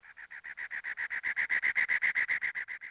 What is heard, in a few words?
wren; Soultree